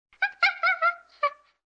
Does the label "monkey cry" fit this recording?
Yes